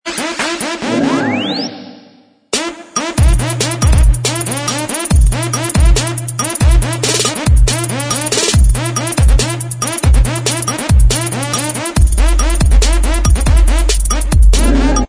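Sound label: instrumental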